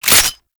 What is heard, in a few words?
Uzi Reload; Warframe; Weapons; Tenno; Prime Tenno Uzi